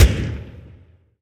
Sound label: NR23 End; Weapons; DCS